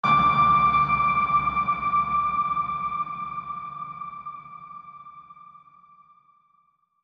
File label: Mountain Breeze Icy Ping